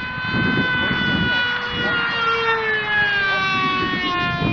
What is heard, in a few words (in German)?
vorbeiflug